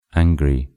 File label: angry